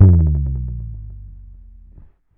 Bass one shot Slide Low, Electric Bass; Slate Digital BASSHOLE Sample Pack

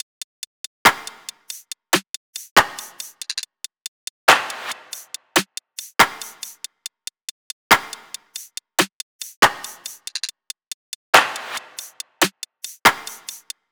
top loop, Drums, Loops, Top Loops, Pop

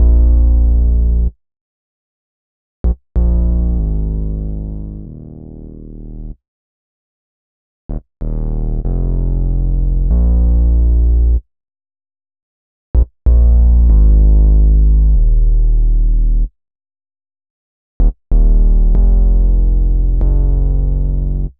Synth loop 95 Bbm, Synth Bass Loops; Slate Digital BASSHOLE Sample Pack

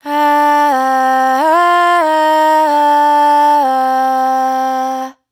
riff Cm, Dry Vocals; Raw Vocals; Slate Digital Omnivox Vocal Sample Pack

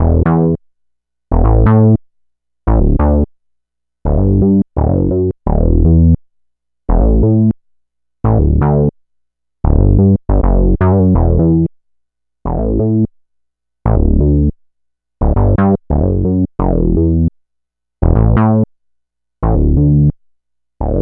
Synth loop 86 E, Synth Bass Loops; Slate Digital BASSHOLE Sample Pack

